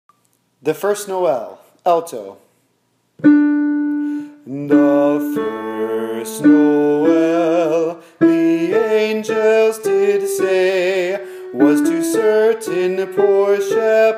alto the first noel

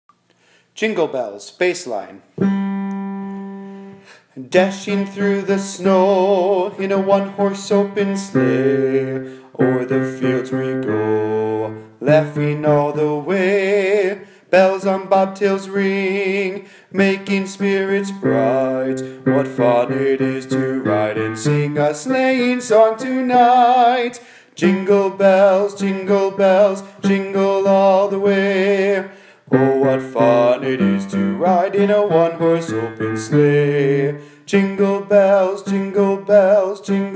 bass jingle bells

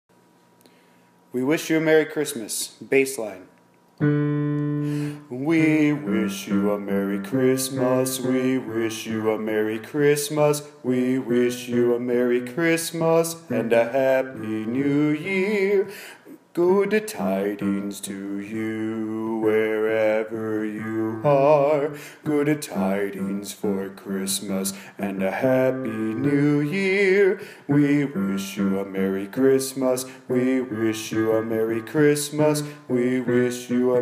bass we wish you a merry christmas

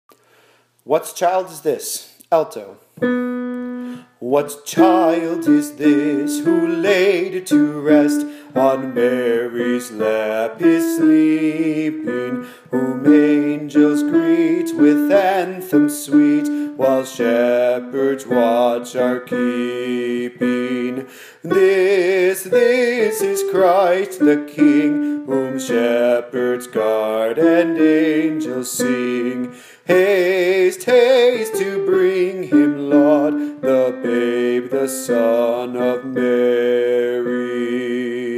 alto what child is this

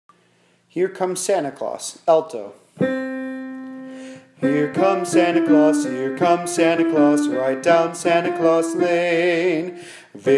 bass alto

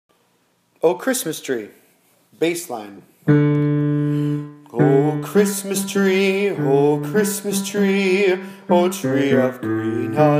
bass oh christmas tree

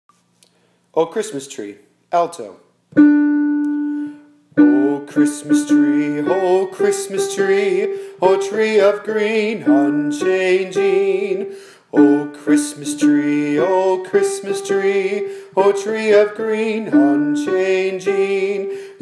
alto oh christmas tree

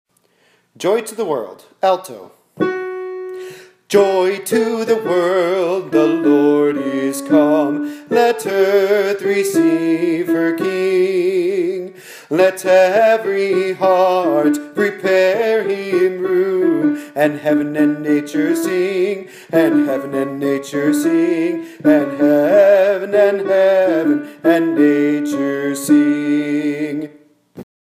alto joy to the world

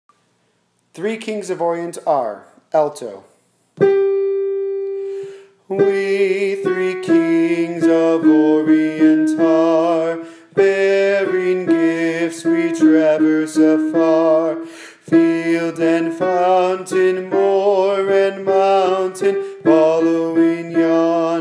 alto we three kings

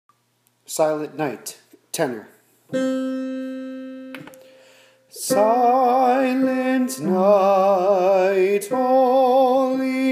tenor silent night

